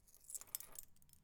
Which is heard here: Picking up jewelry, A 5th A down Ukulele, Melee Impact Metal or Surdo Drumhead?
Picking up jewelry